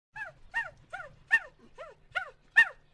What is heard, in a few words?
monkies, colombia; ambience; Soldier Of Fortune II Double Helix; XBOX